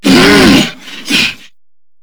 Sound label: Grineer Hammer Boss Grunt C; Warframe